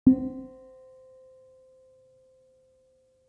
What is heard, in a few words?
ambient